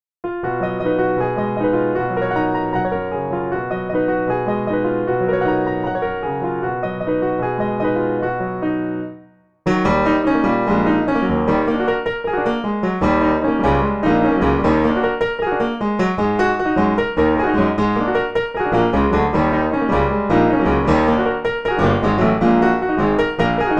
jazz

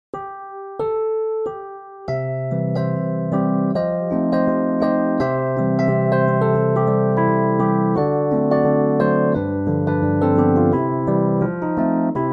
MK ll; Spark

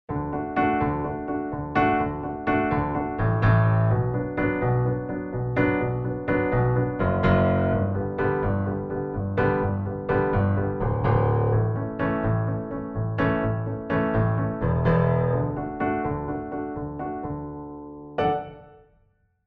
Piano

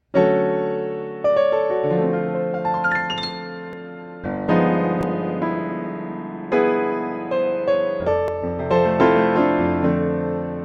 Japanese Grand

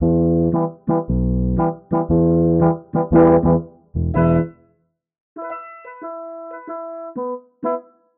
synth brass

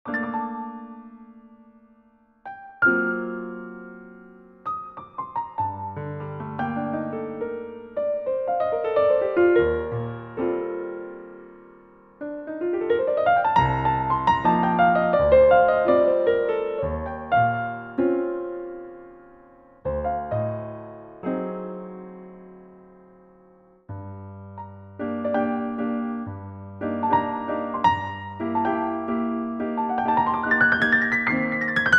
Akai; Eloquence

